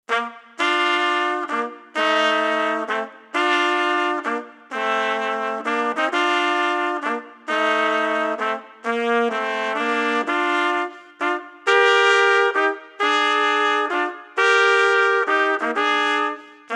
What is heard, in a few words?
Blues